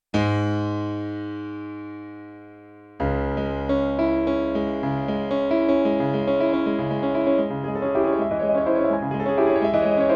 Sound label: Superb Grand, piano; SonicCell SRX-11